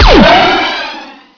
lazer; magnus

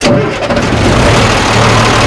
startup, tank

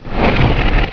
pots

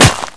gravel, debris

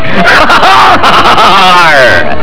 laugh

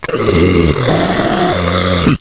zombie alert

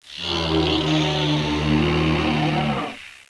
geneworm idle; svencoop

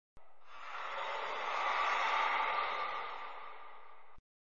howl; ns enceladus

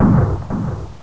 big gun

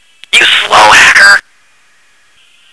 phone slow hack

